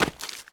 mud, footsteps; player

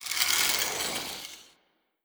zombie scratch, brickwall

